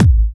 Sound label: Hard Kick; Vengeance Samples